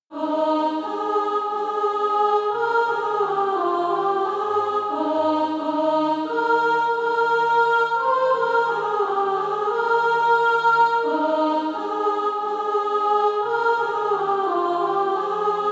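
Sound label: soprano, SATB Practise Music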